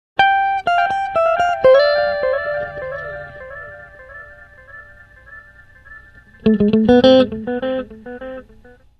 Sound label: Delay